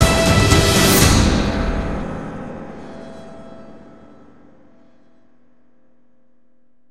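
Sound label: STINGER ACTIVATE TRYP ORCHESTRA TAG, soundtrack; transformers war for cybertron gamerip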